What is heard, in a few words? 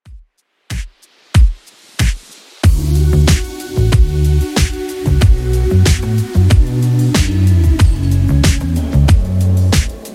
Top40 Redrum 93bpm